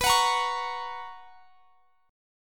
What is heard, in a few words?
B Major 7th Suspended 2nd, chord; strummed